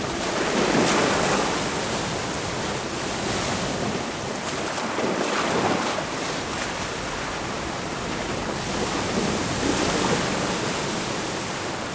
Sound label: waves